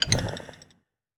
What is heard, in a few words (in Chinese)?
宝库激活声